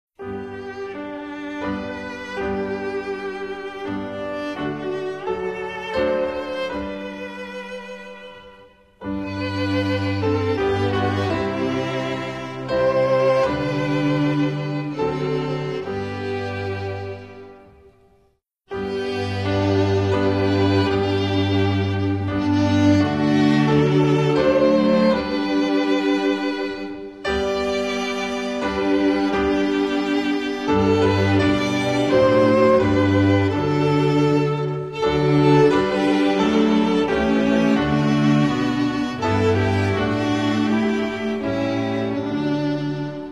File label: instrumental; hymns spanish